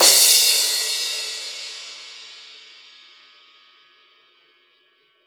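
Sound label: crash, Drums Acoustic; AKAI; 90s sample CD; USB Soundscan vol.10; RAW KIT 8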